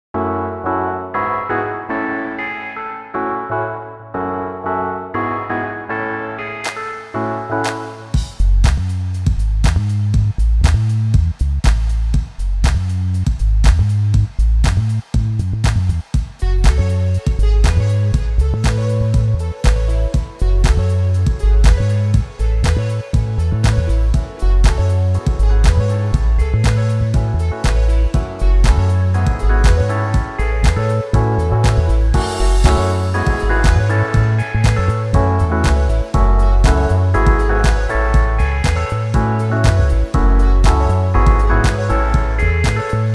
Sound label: Classical Happy Violin Calm; 婚礼竖琴古典的快乐的小提琴平静的